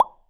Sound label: pickup